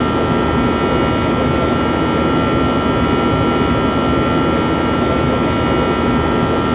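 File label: CFM buzz wing forward, CFM56; A32X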